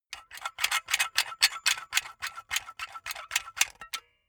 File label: silicone gun empty pump fast; berufe; handwerker; soundpool